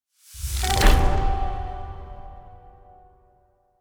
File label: achievement unlocked; factorio; Factorio 0.14.18